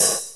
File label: juicy high hat g sharp key